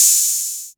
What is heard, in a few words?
808 HAT; E-MU Formula 4000 Series Vol. 2